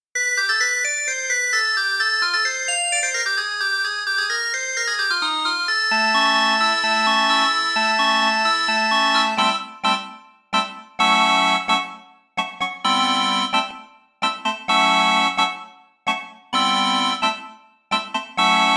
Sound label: organ